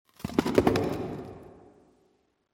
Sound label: cavern excavation rockslide; Half Life Alyx